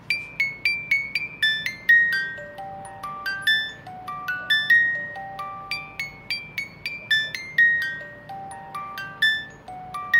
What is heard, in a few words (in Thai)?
กล่องดนตรี; Fur Elise